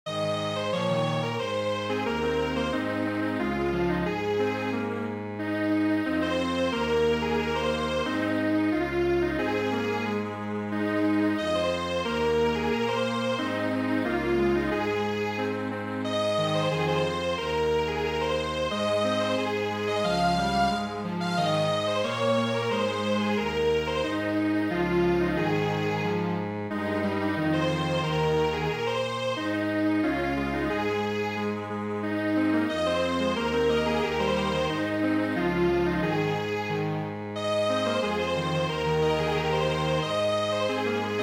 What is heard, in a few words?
Strings Quartet